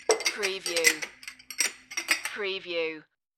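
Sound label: Stacking Glasses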